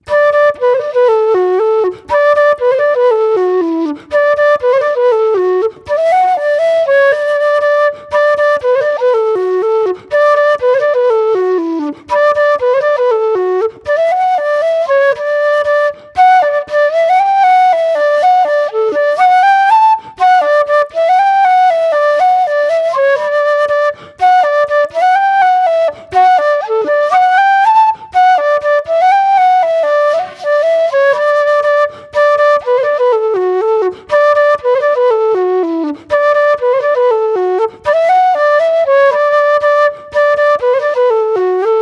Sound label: Polka; Armagh Polka